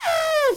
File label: elephant; zoom